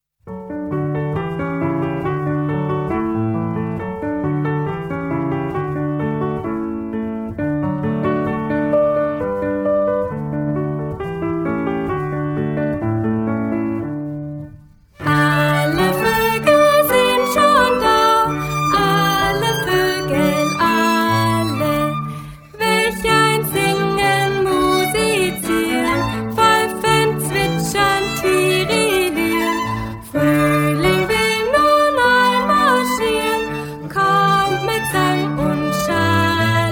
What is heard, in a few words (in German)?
Alle Vögel sind schon da fade out